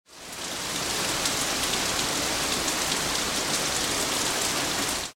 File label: Rain